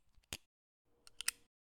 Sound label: Revolver Reload